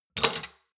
shot glasses grab multiple medium; soundpool

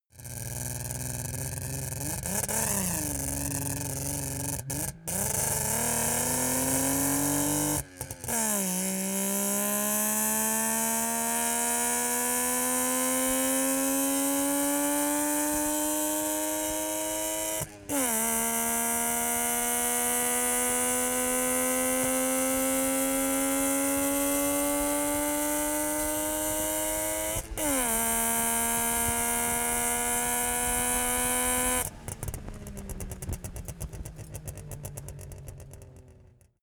car saab 92 accelerate heavy exhaust

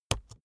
keyboard laptop enter press slow hard

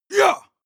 male combat attack short

